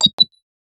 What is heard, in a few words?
game like arcade enemy, arcade